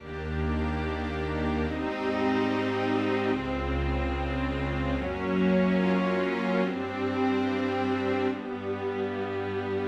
Strings

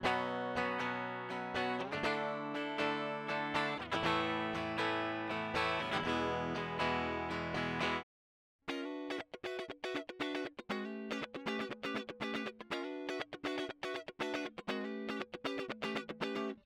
UltraLite mk5 Gt

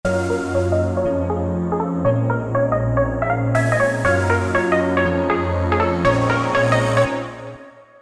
synth; usynth

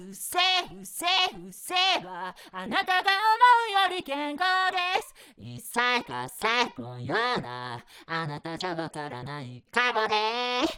AUTOTUNE Hamo

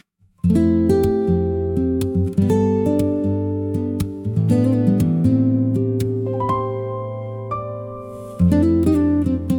Piano Phrase Add Instrumental

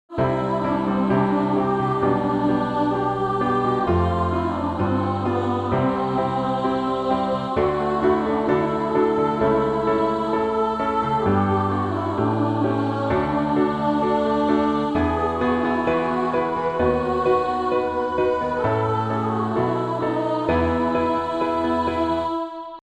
c d e

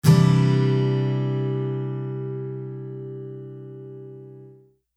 G C add9

